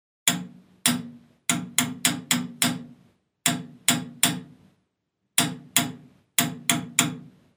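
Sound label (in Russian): стук по батарее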